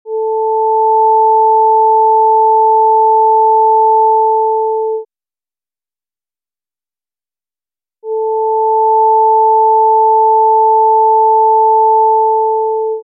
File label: Sine 440 and 880 Hz Phase 0 and 90